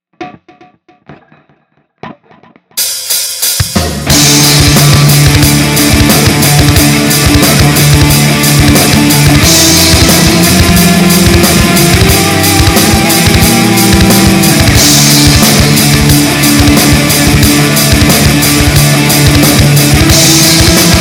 guitar oitavada

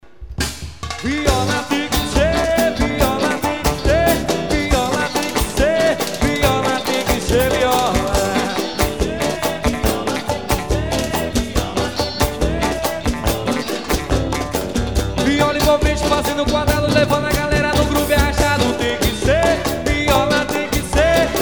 viola

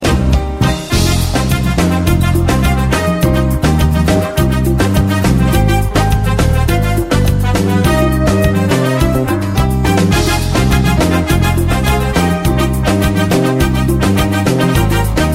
ringtone

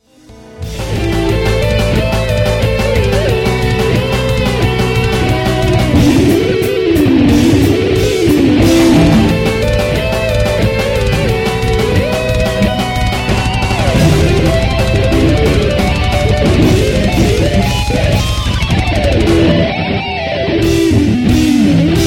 with two guitars

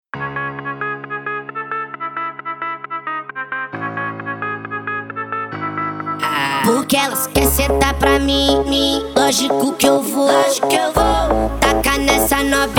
bass mega funk

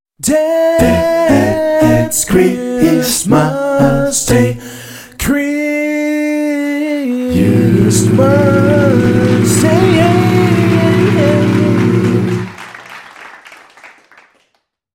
acapella version

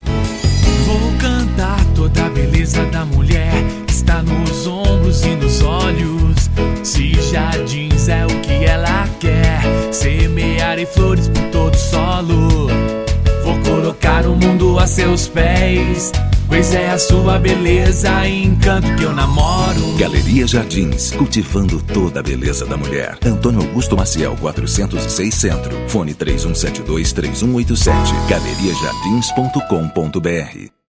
jingles; galeria jardins igarapava

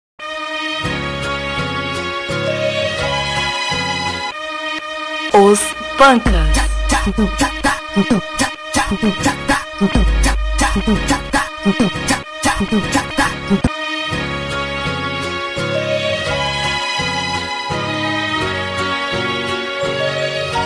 funk session

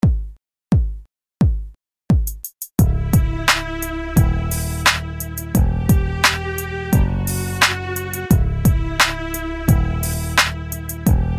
instrumental

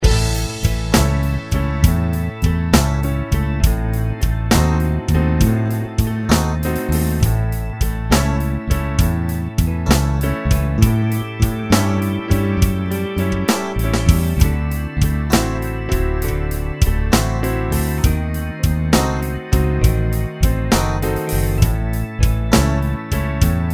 keyboard band pop; band base

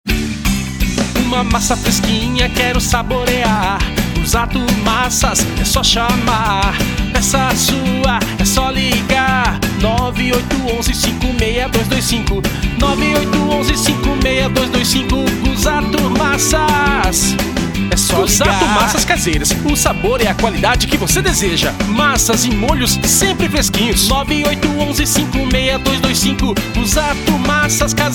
jingle; guzato massas

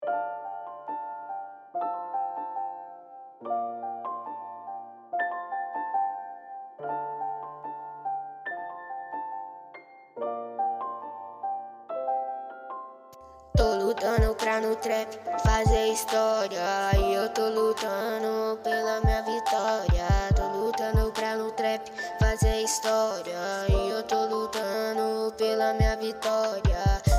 trap

